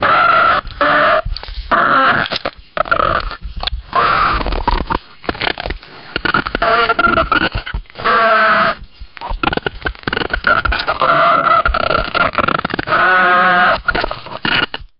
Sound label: crunching bark grunting; background music